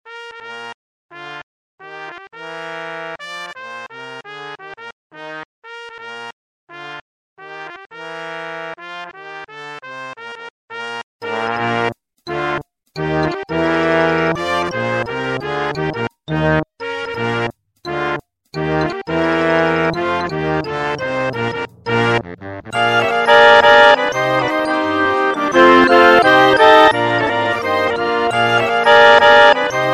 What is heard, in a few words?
jazz suite